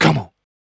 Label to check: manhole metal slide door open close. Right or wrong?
Wrong